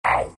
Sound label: big pain; Undying